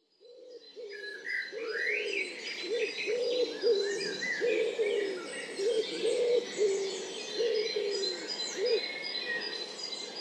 little heath hertfordshire dawn chorus may

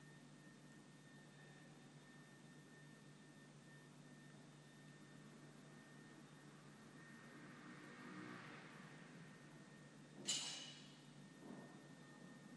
st james park tube station

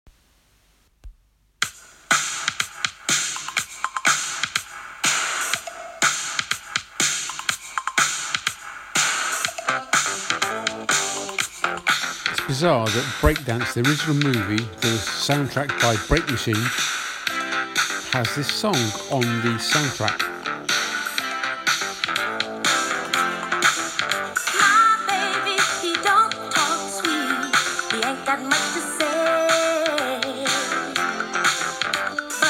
music

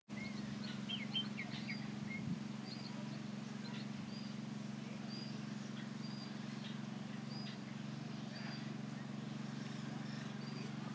bird

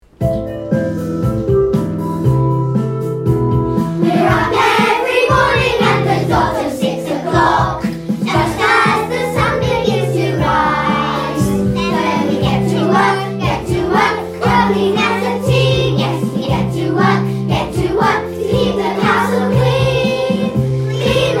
Team work choir

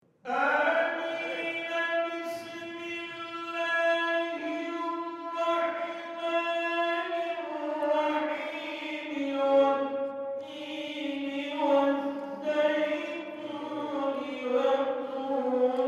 adhan call to prayer cyprus

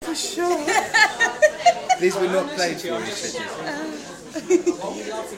laughter

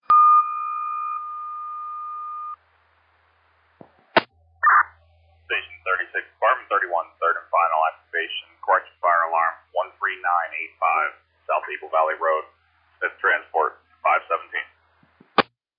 page

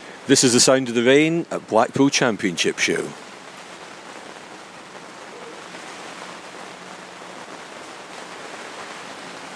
sound of the rain

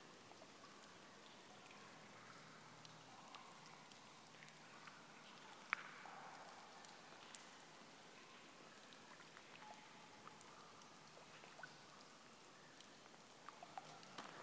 spooky cave

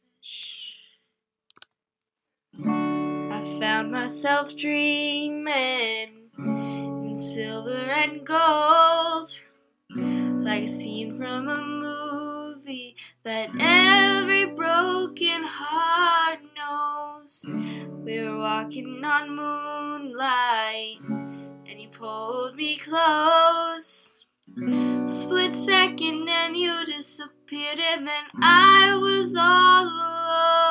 acoustic cover

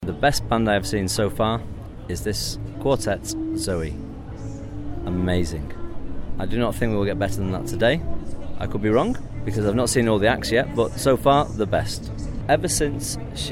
audience view quartet

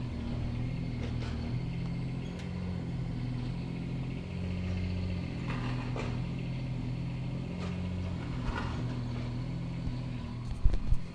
noisy neighbour digging his garden

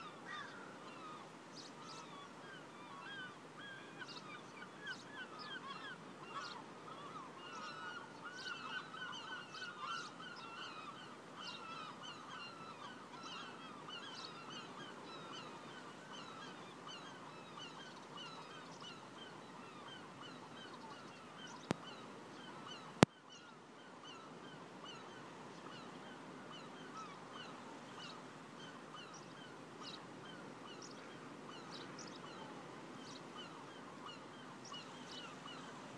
sea gulls and other birds

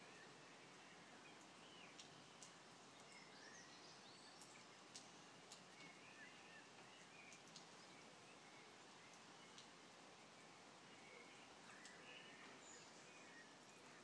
early evening rain and bird song

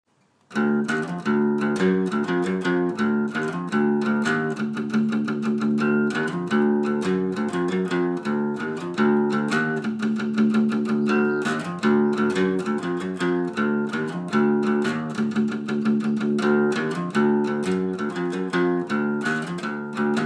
acoustic hardcore

